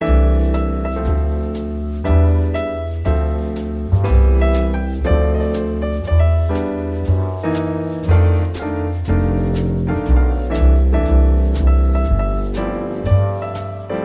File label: Jingle Bells